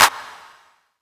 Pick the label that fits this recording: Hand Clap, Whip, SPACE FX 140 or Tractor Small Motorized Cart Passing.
Hand Clap